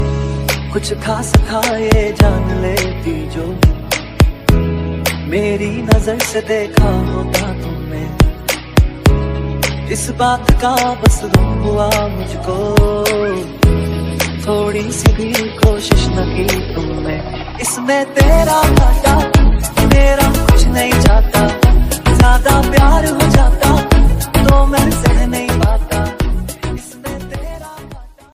dj remix sad music ringtone, bollywood

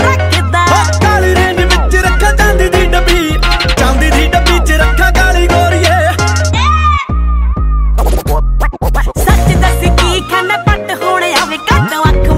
punjabi ringtone

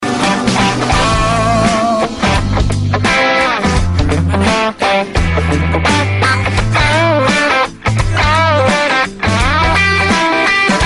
lion guitar ringtone

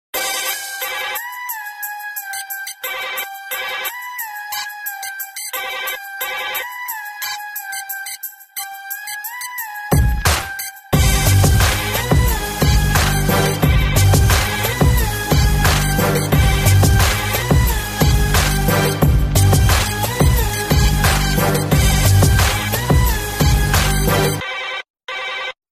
droid beat ringtone

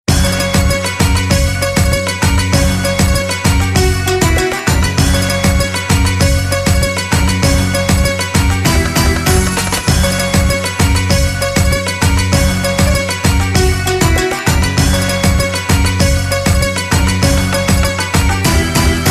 arabic dance ringtone

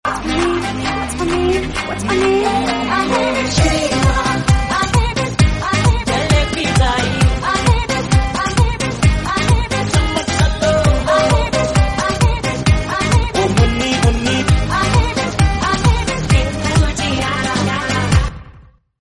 remix song tone ringtone, bollywood

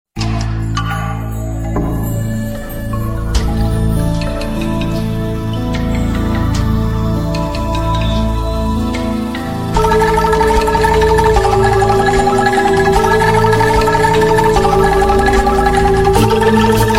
nokia bamboo sound ringtone

